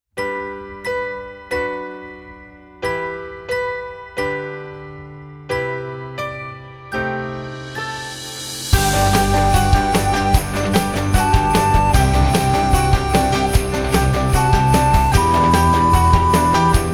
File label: Jingle Bells; JingleBellsBAG